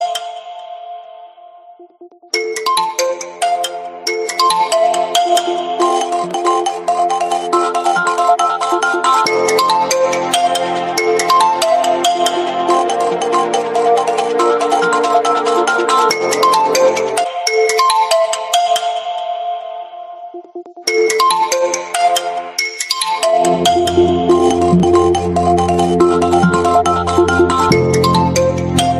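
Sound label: Oppo F11 Pro, ringtone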